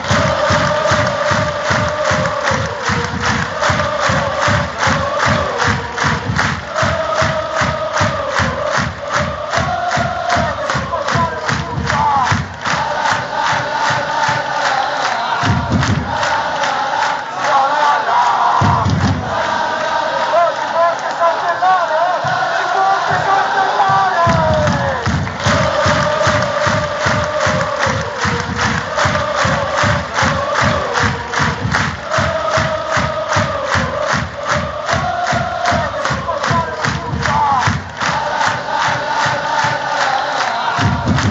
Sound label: best pisa chants